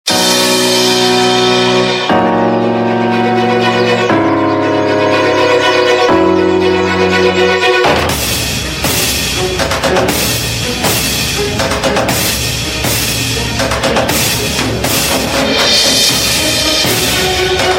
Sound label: Dramatic Tune; Silsila Pyaar Ka